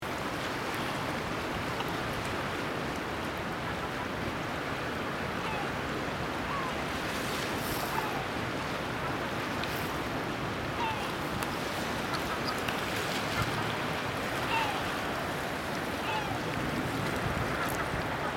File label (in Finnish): merilokki; luvia